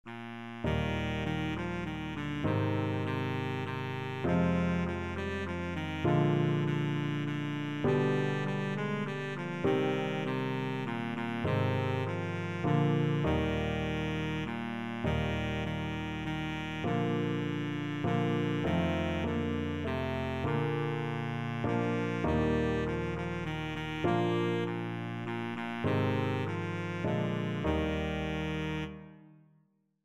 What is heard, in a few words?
we wish, baritone saxophone